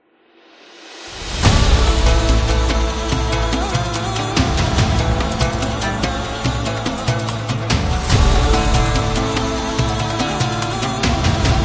Arab

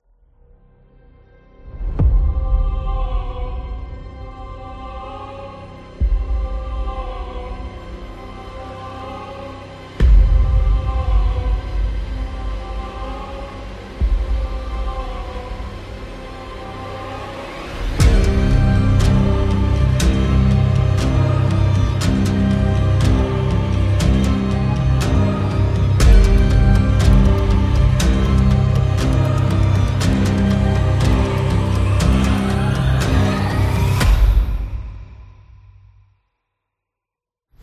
Cinematic Suspense

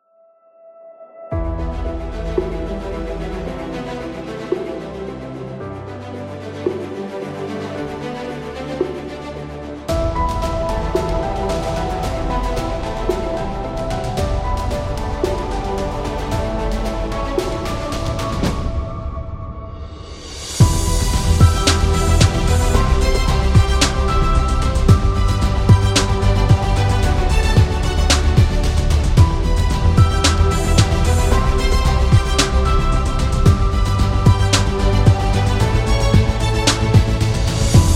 Product Ad

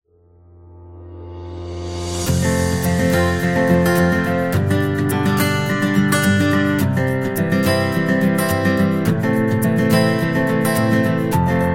Positive Guitar

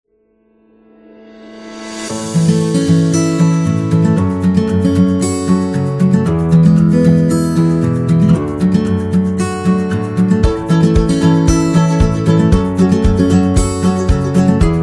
Summer Acoustic

